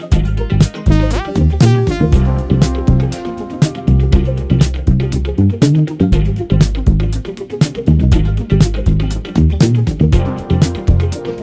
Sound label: Silver Bass; nu-disco